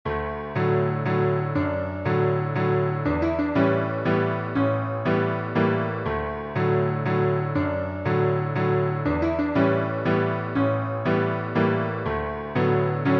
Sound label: piano